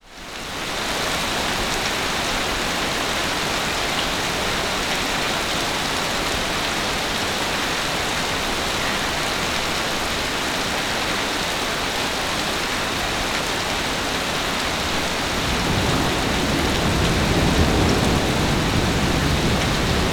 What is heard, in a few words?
Heavy Rain In The City